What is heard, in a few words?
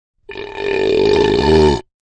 Deep Burp, Hearty deep burp.; Human; Burping